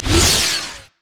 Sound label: hammer smash; LoL; taric